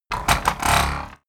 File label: sci-fi electromechanics expand evil